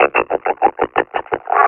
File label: laugh, officer; Half Life Alyx; Half Life Model Pack